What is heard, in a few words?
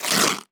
Tearing Rustle